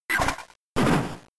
Vital Throw; pokemon; gen 3; attack moves

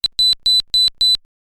Extend Sound

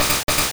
Bite; pokemon sfx gen 2 attack moves gsc

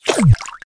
Fish Sound

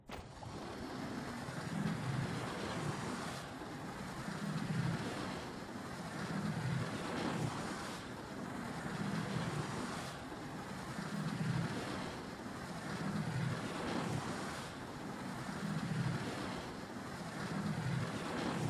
Loop Slide Falling

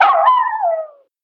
Hit Fox; Toy Story Mania; iOS gamerip

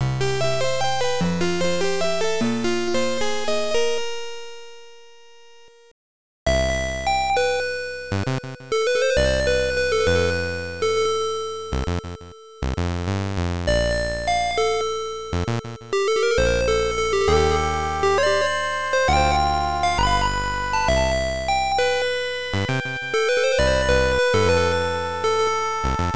Waltz of Water and Bubble

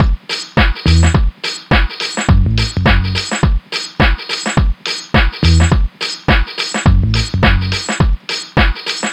Beats beats; Frogger in Toy Town

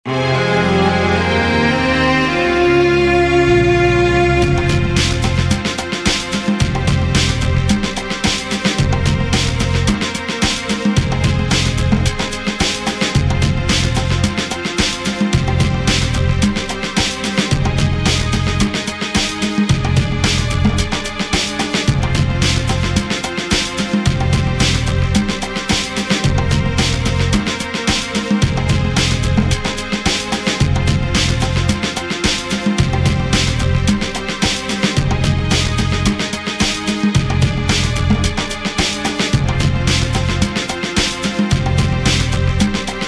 Battle Lose

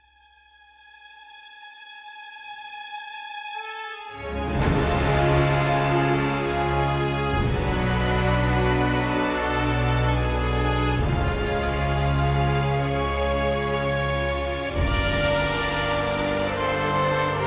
Cinematic Music; Light Speed

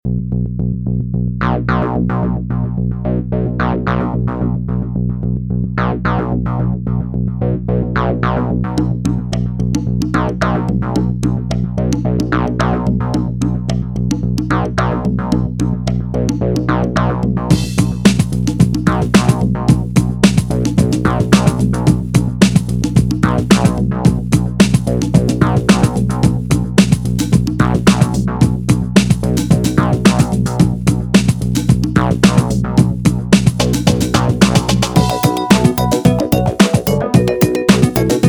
Beat Ambiance; streets of rage remake

